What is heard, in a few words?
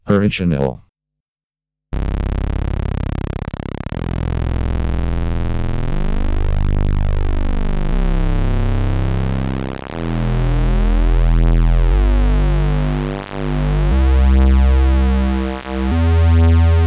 MS20 Original Mini Legacy Square Unison